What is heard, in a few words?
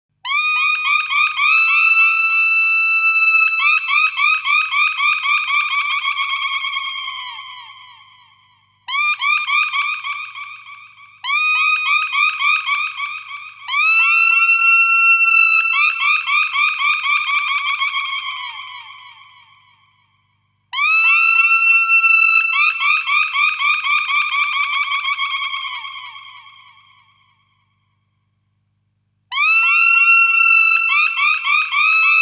seagull echoes